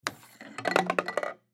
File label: Air Hockey Mallet Hit Puck Scrape Score Goal; SoundEffects-Blastwave; Sports